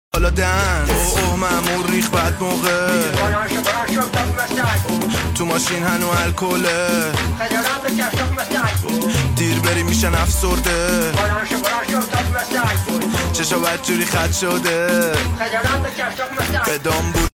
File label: Remix